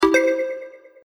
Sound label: default gift